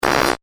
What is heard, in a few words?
Electrical Zap; BlastWave